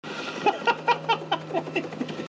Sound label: laughter